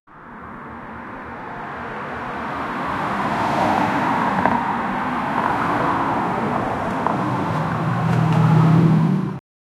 cars driving down an even; evening

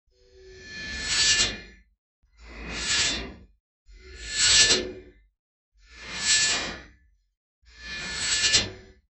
mechanical noise like a m, scratch

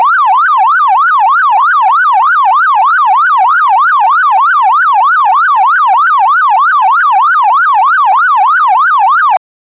sirens, police

